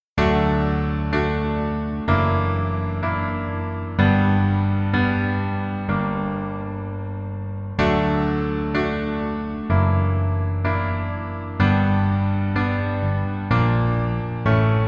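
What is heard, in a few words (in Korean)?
Piano version 멜로디 MR -1키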